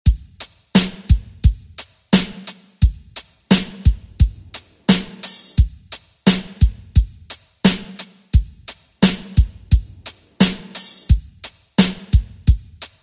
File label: ReDrum; DJ Mhark